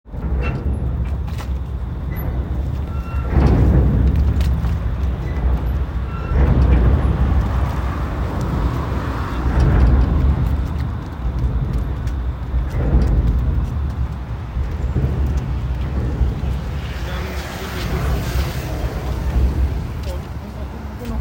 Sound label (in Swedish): container sodermalarstrand gnissel